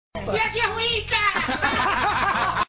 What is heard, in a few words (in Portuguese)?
vinheta; panico na band; piadinha ruim